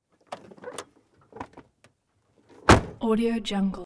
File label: 1986 cadillac de ville sedan; prosoundfx